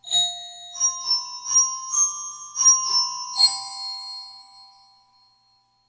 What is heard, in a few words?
Glockenspiel; TSMtoolbox